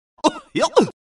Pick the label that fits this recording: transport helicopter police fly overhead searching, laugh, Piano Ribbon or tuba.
laugh